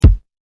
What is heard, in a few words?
KIK